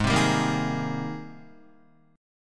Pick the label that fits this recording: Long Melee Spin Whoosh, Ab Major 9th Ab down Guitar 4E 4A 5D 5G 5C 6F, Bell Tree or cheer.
Ab Major 9th Ab down Guitar 4E 4A 5D 5G 5C 6F